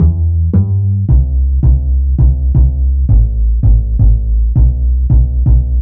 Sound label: A2 165bpm, Bass Loops; Total Drum&Bass